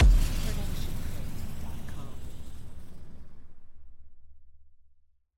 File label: Cinematic Hit